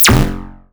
Digi Perc; Percs

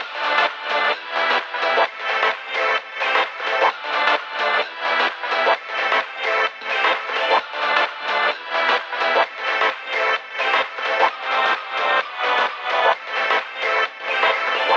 Root A 130 BPM; Vengeance Samples; VEH3 Special Sounds

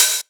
Cymbals Open HH; Vengeance Samples

